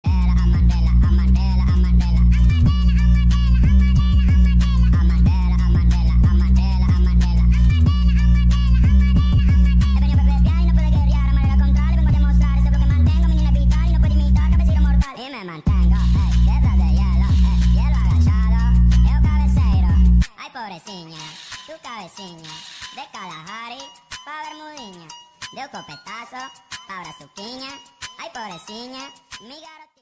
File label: trap remix